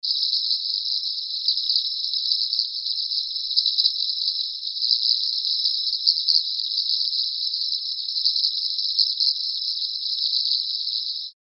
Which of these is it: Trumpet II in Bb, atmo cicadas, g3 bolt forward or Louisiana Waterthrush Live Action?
atmo cicadas